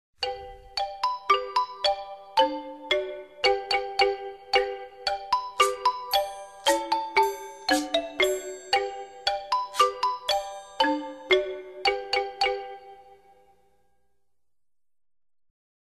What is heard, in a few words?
music only; head shoulders knees and toes; japanese; childrens songbook